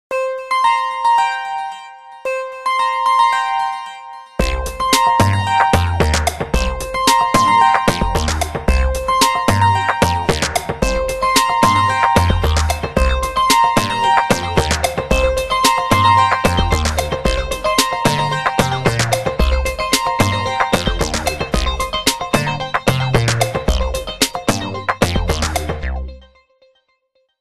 Ring Angels, ringtones; Microsoft; Windows Mobile ringtones; Individual download-only (2008)

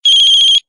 Cricket; Pantech Flex P8010; alarms

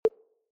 Effect Tick, ui

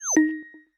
Exclamation Mark, notifications; LG; Folder-Y110S

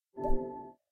watercolour tap; Samsung Galaxy Note 10.1 SM-P600

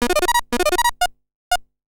biptone (New Player), ringtones; Google; NewWave Labs

